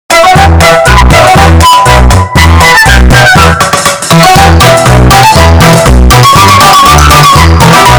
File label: bass boosted; angry birds